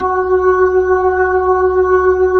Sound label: B-3, Jazz